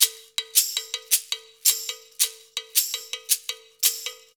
110 MIX, Percussion Loops

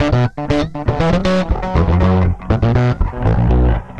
Processed Electric Bass

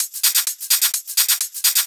Hihat Loop 128 BPM

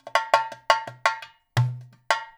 fill, percussion

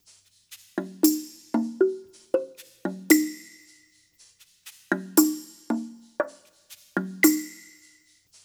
percussion loop

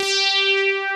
SAW LEAD; Pro-Rec - Synthsonic; TRINITY

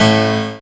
pno e4, Fluegel Bass, AKAI S-612 + S-900; Retro Sampler

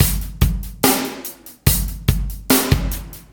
CB LOOP; Zero-G; Chemical Beats

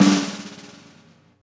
Rock Snare Ghost, Roland BK-5; Snares

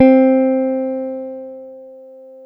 C 3 JAZZ GTR; Univers Sons; Jazzistic

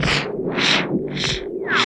NOISE; East West - Phat & Phunky

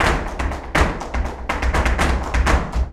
BULERIAS TAP, Flamenco

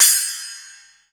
Splash, EMU Orbit-3 drum machine; 300 Drum Machines

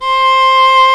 STR, Viola Solo

